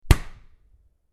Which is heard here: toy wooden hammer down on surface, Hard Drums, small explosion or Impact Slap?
Impact Slap